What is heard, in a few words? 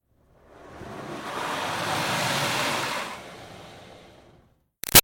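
Water Spray Interior Car By Soft Slow; Car Wash; Spraying; Objects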